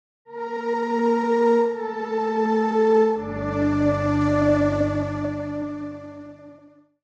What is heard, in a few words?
Game over you lose futuristic sci fi